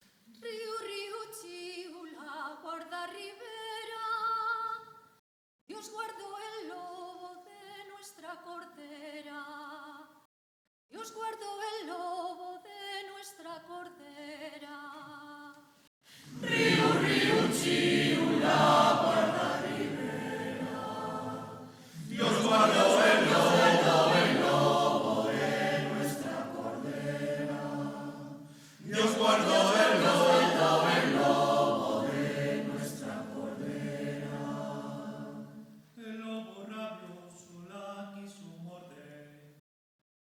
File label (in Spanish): Coro Polifónico; villancicos